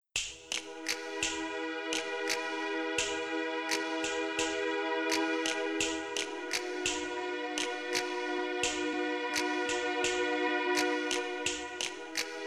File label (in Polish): muzyka do masazu, 432 hz; gleboki oddech